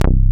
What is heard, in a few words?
BASS, SYN BS